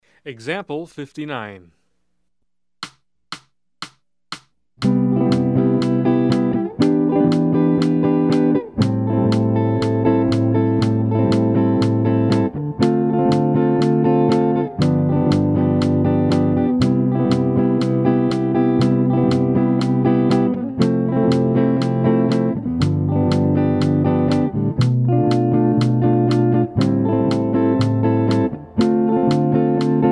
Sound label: Jazz